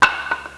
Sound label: chock, busses; WAV Sound Effects; transpo